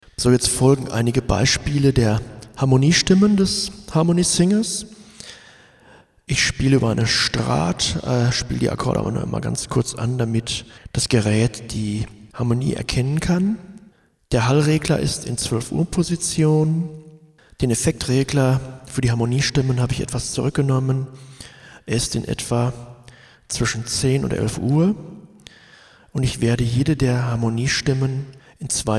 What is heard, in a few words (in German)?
Einleitung Harmonie Stimmen